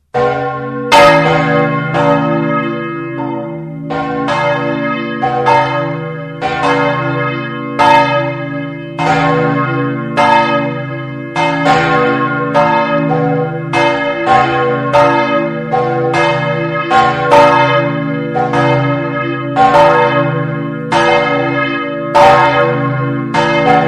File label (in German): glocke mitte gross